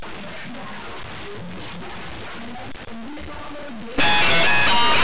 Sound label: jumpscare